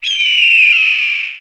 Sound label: eagle screech classic high